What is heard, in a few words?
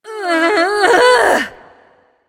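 grunt lift, female; smite; voicepack; hera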